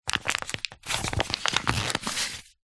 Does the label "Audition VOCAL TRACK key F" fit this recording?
No